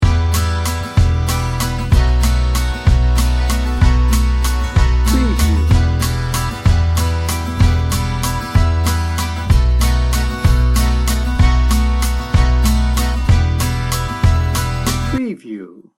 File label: italian romance loop